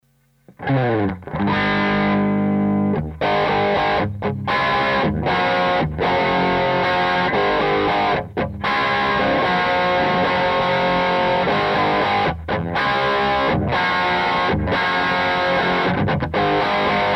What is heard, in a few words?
Les Paul Gain Treb 12 Mid 11 Bass 10